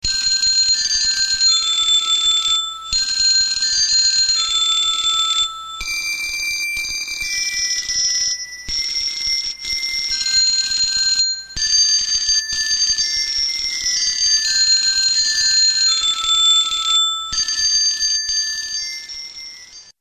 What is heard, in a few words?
silent night ringtone